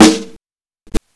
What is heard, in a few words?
Snare Warm, Drum and Bass; EZ Rollers; Instrument Samples